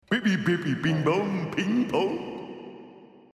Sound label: sfx visitor; Mr. Bean - Backyard Junk